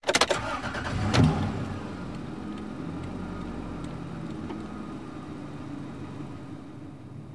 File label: police car start int; Original TK Sounds